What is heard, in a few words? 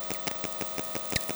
chirp; physics radio